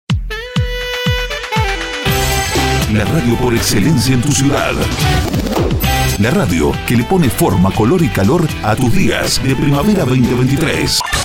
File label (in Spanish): PRIMAVERA SWING